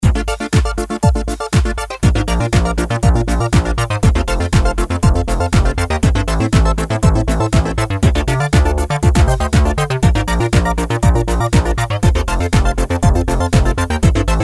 Combinator Dance Patterns, Propellerhead Reason 3.0